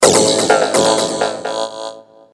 Funny Sms Tone

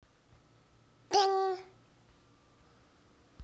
Ding

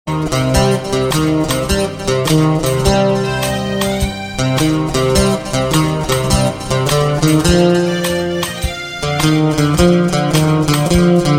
Guitar Tone

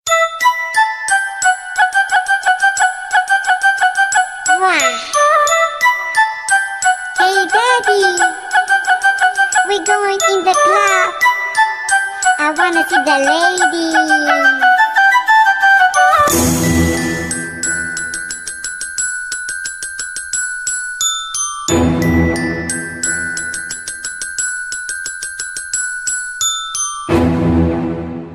Cute Baby Tone

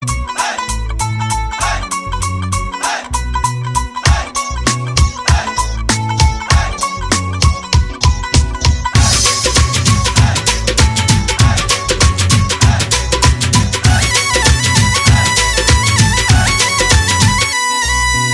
Dhol Mix

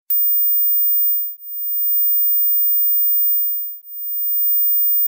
Mosquito Noise

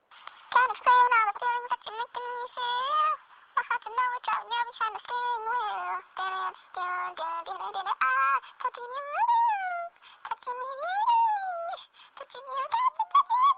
Chipmunks

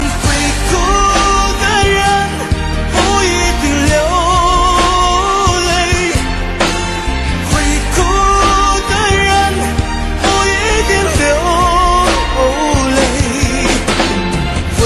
Chinese Song

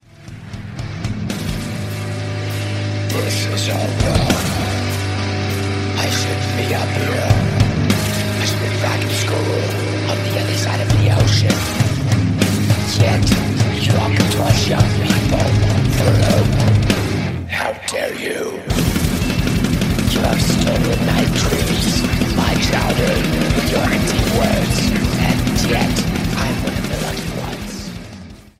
Death Metal

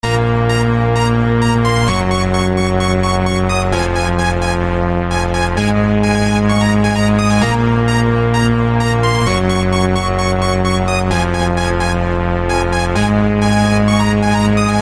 Melody Melody

